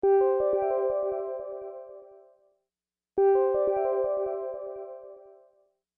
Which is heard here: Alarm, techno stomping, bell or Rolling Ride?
Alarm